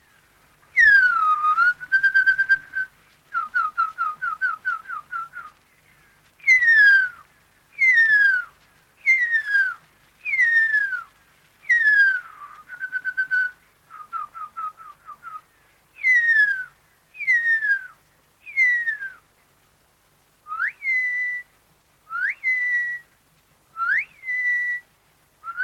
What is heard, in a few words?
Bird Man Call